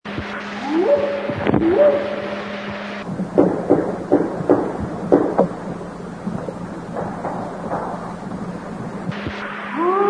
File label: Whoop; Sierra Sounds